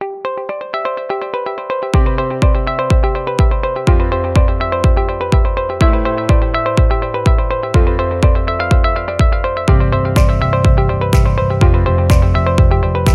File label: A Positive; corporate beat